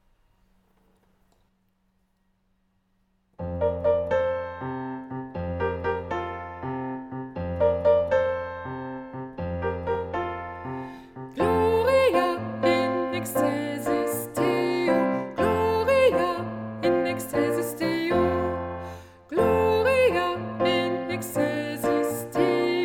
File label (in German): Gloria Sopran